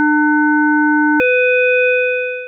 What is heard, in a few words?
300 500 Hz; Cerne klavesy